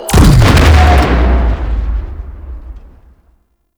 Ship Explosion Initial; Cinematics; Grineer Eidolon

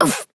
Pain, Adult Operator; New War

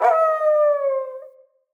Wolf Cub Trapped Call Far; Animals; Wolf Cubs; Orengal Wolf Cub B

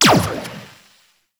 Shot Fire, Weapons; Akarius Prime

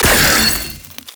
Shot, Weapons, Sentinel; Warframe; Verglas Prime

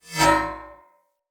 Spear Whoosh Accent; Weapons; Enemy; Spears; Nimrod Spear

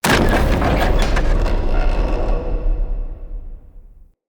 Drawbridge Start; UnderCity

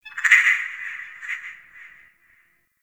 Forest Distant Frog Call, Ambience; Warframe; Grineer

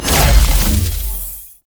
Shrine Assemble Ghost Despawn; Warframe; Duviri Murmur

